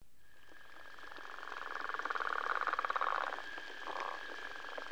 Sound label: northern leopard